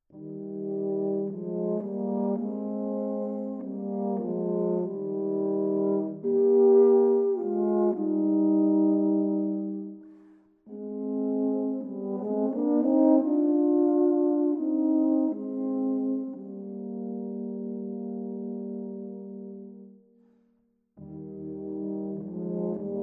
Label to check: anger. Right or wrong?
Wrong